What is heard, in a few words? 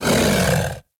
Kubrow Feral Vocal Grineer Finisher End Snarl; Warframe